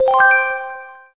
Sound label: sms huawei p30 pro